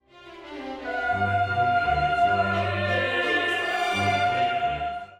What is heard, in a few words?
Kyrie Ascending Jump